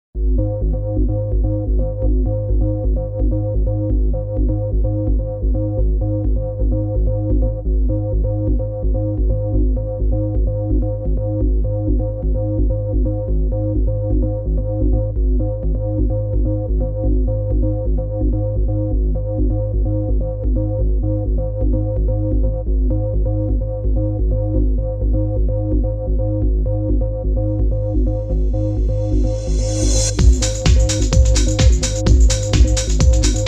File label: birds deep trance mix